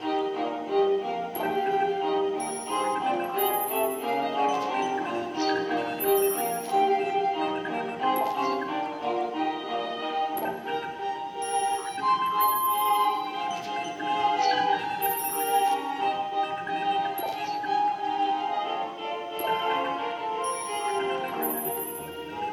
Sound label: Menu Amb Lp; Jetpack Joyride; Android; PS Vita; PS3; PS4; PSP